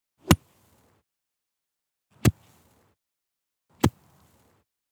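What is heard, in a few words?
golf club swing and touch ground; stereo; soundscrate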